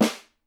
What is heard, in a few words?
SN, Percussion